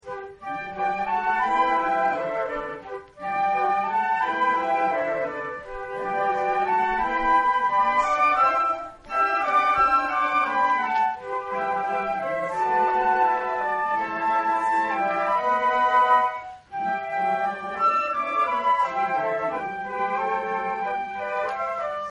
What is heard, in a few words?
concert, flute; AUTUMN concert 2016